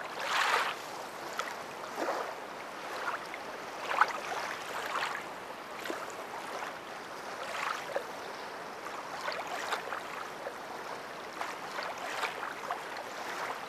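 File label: Ambient Ocean Swimming; Lewd SFX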